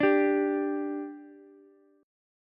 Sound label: G 5th G Ukulele, chord; G5 strummed